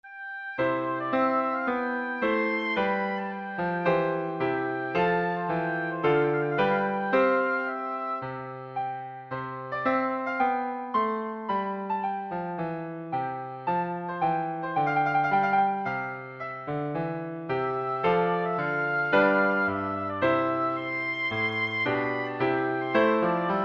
greene trumpet tune, oboe

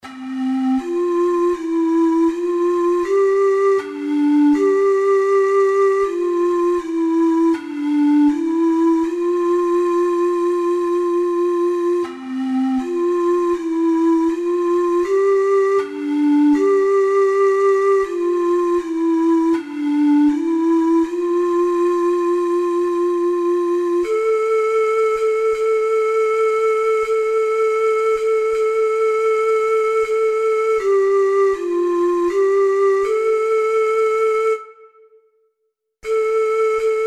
aura lea, ocarina four hole

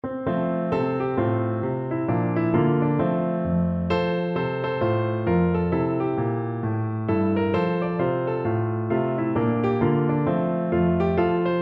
all for me grog, piano

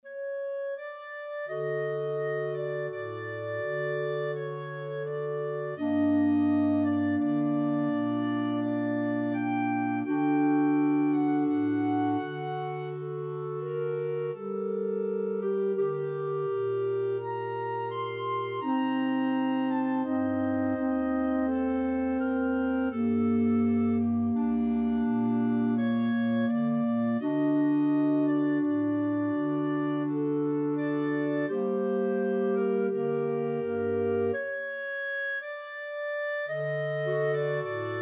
6 clarinet, clarinet choir

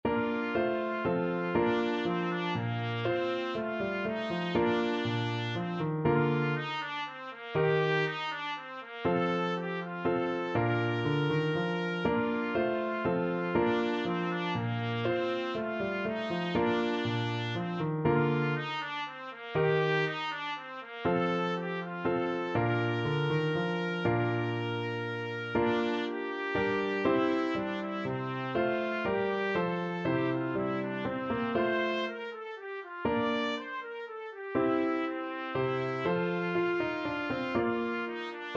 minuet, trumpet; bach; bwv822

